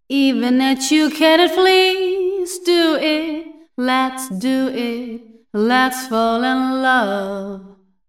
Nonlin Vocal

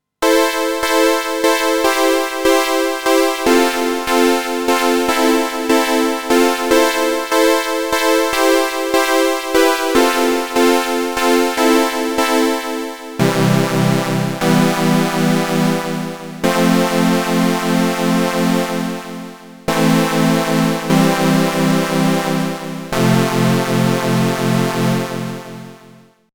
Juno 60 Puls Saw Chords

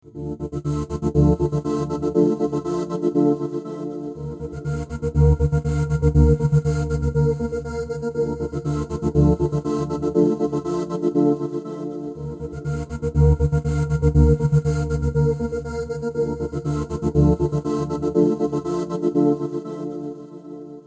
FBA Gater

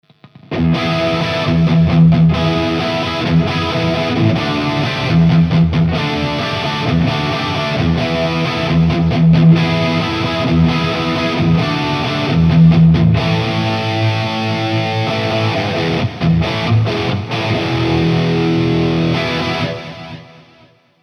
Mod Delay Reverb; EVH

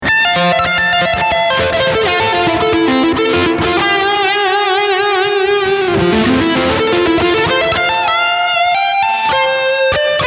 Room Short

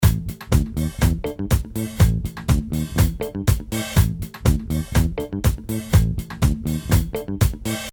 Uncompressed Loop

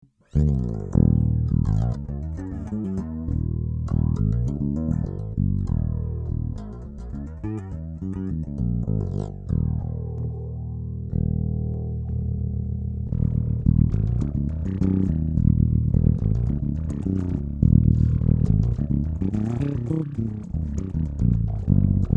Flanger; SR KAOSS

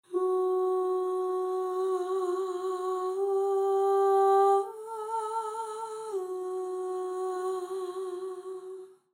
Vocals Phrases

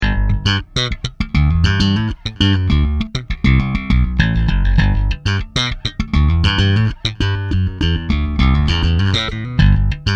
slap

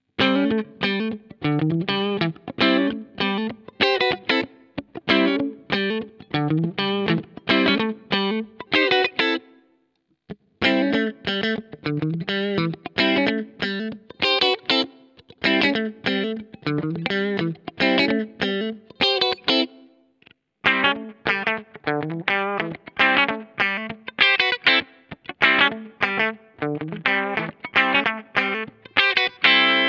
Schecter Clean Neck to Bridge Splitted Humbucker; Rob Scallon

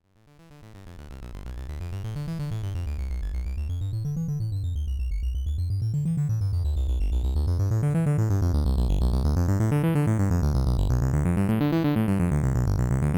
Sonicware ELZ 1 DNA Explorer Synth

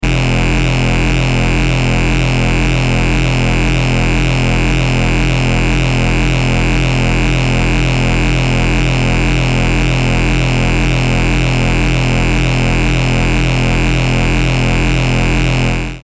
Moog DFAM Phat Drone

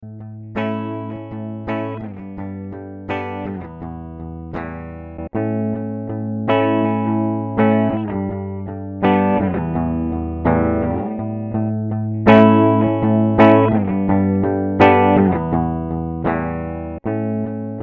Boss EQ 200 Mids and Lows Clean

